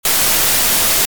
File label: sign and noise